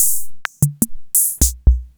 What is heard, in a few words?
rhythm ace, Samples Sliced; m8